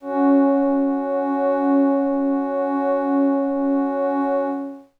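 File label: Glass Howl Kawaii F sharp 6; pads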